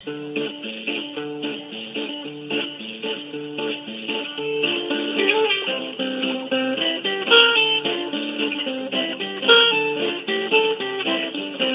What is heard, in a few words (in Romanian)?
muzica